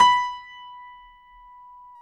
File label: PIA GRDPI, Roland